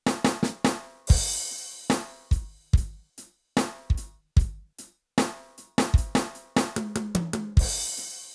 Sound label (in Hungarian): dob mono Compressor